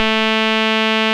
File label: rave beep A2; Best Service Alpha Dance vol.2